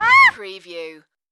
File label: Duck Call